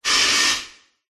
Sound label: industrial machine air burst hiss release large slight reverb; zapsplat